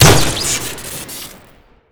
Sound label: flechette shoot crit, weapons; tf2classic